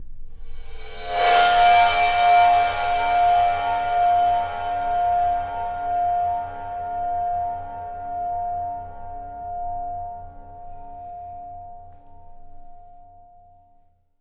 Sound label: Void Perc; The Void; Processed Drumkits